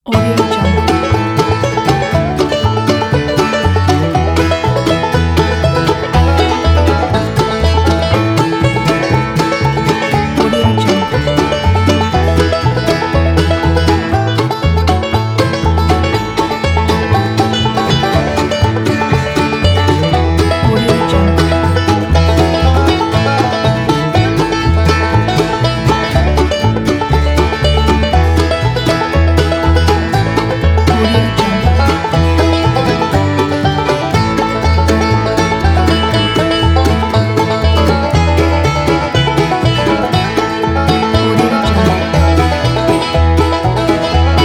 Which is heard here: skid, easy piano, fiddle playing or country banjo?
country banjo